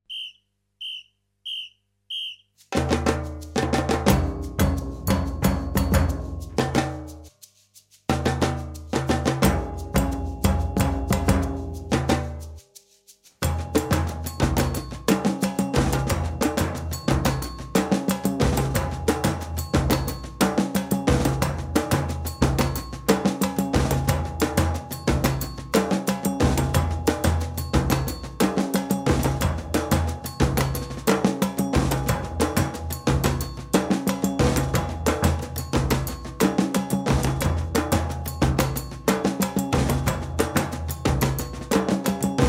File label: groove